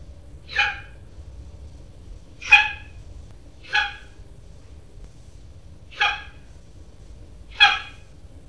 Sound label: Acinonyx jubatus yap